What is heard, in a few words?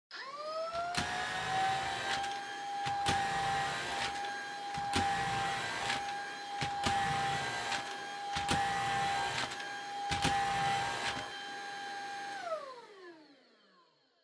Vacuum Cleaner Vacuum Floor Low; 掃除機; バキューム 床 低